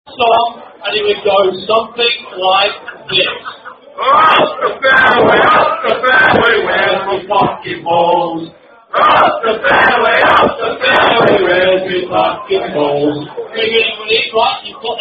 Rugby Song; Innsworth Dinner; Unknown Rugby Song

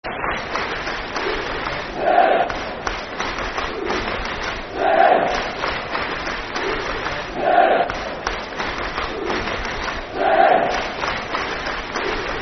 City (Clap) Football Chant (Soccer Song); Bradford City Bcfc

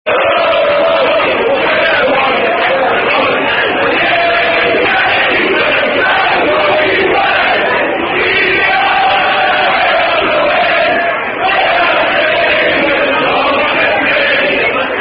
Unknown Football Chant

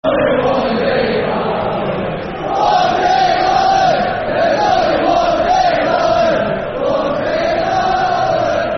Football Chants (Soccer Songs); One Dave Allen; Barnsley Bfc

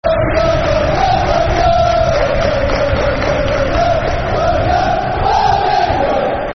Football Chants (Soccer Song); Birmingham; Birmingham City Bcfc

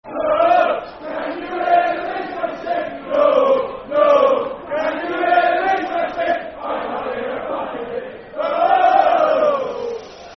Football Chant (Soccer Song); Can You Hear The Leeds Fans Sing; Milton Keynes Dons Mkdfc

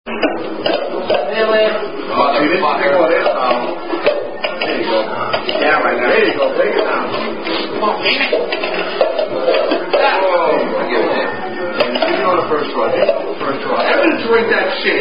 Flip Cup Battle, Drinking Game; Commack Highschool Class Flip Cup Battle

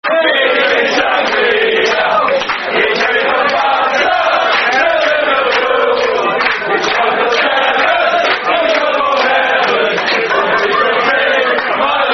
Football Chants (Soccer Songs); Luis Garcia; Liverpool Lfc

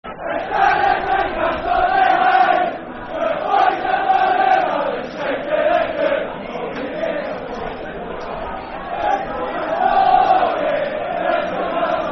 Football Chant (Soccer Song), Hibernian; This Is Ma Story, This Is Ma Song; Hibernian Hfc

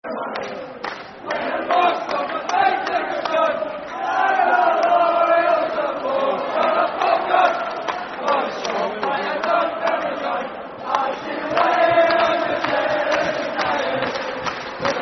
Football Chant (Soccer Songs); Town Of Dunfermline; Falkirk Ffc

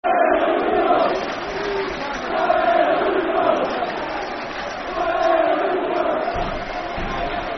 Football Chant (Soccer Song), Aberdeen; Sone Aluko; Aberdeen Afc

